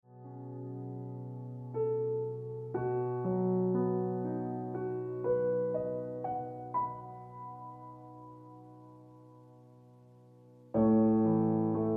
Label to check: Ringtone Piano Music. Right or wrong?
Right